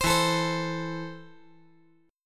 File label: E Suspended 4th Sharp 5th